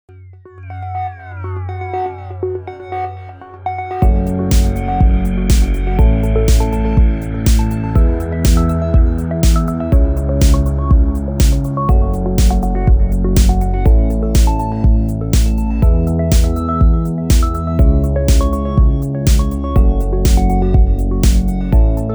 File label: Mondfahrt 122bpm